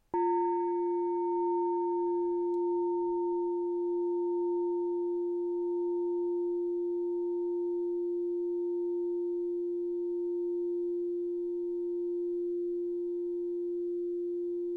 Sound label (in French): cloche tub 50 F